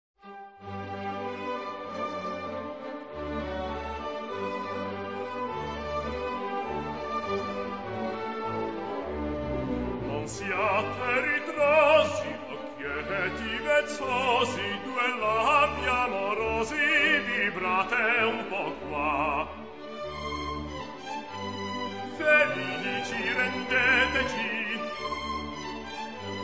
Aria for Bass in D major; Wolfgang Amadeus Mozart; K. 584

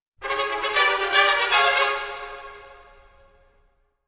Trumpet Fanfare; Who Framed Roger Rabbit

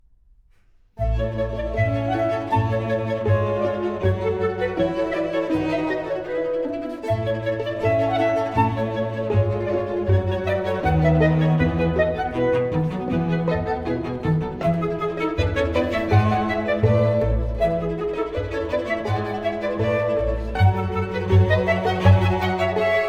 clarinet and strings; Mendelssohn; Lieder ohne Worte, op. 102; No. 5. Allegro vivace

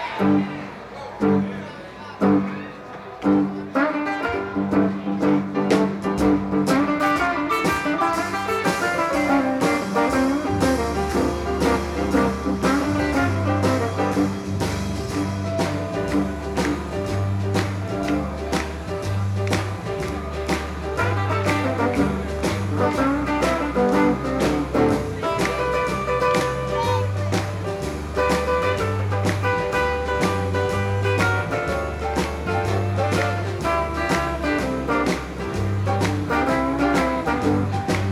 Instrumental Boogie (instrumental)

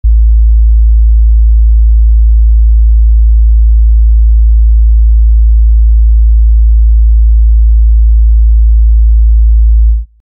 55 Hz

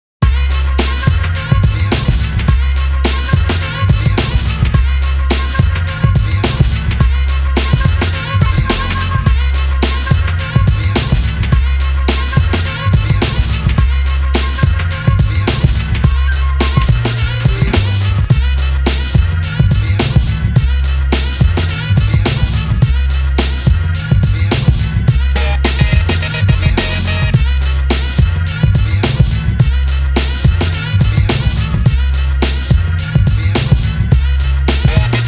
instrumental

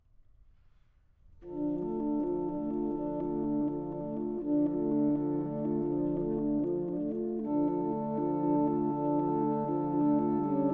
Saxophone Quartet; II. November 25; Ichigaya

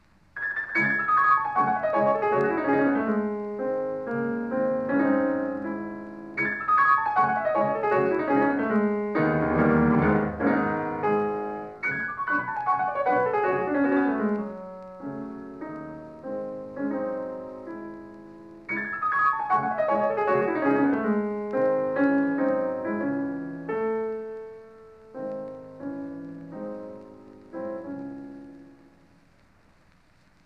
Prelude in C-sharp minor; Frédéric Chopin

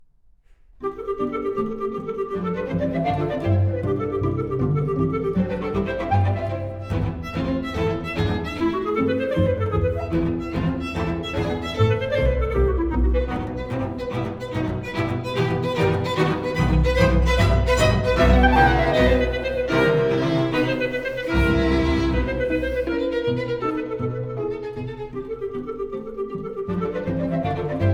clarinet and strings; Mendelssohn; Lieder ohne Worte, op. 102; No. 3. Presto

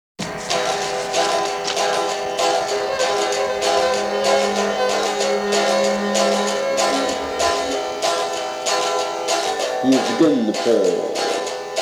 Vox

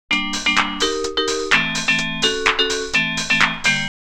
FX and Scratches

